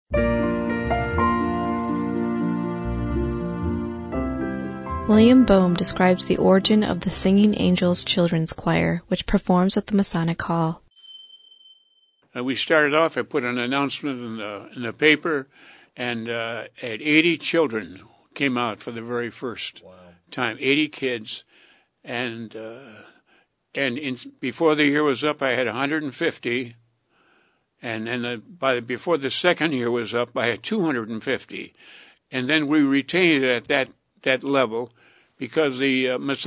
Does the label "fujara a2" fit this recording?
No